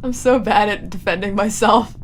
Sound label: ouch; Mori Calliope